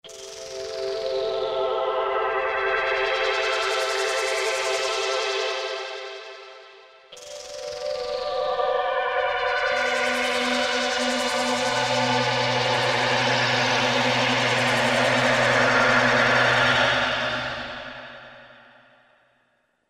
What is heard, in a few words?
ME Blade, Yamaha TG77; Factory Presets